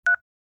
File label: cell phone